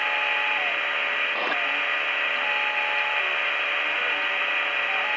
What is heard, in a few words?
hologram loop